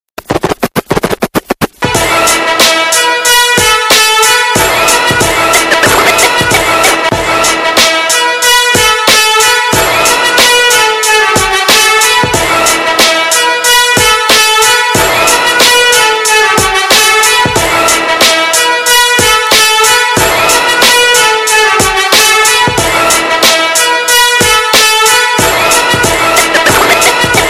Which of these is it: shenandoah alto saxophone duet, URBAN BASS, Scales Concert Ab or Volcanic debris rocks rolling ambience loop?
URBAN BASS